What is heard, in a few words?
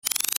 Rollover Multiple Click Ascend; Mouseover; Multimedia; Blastwave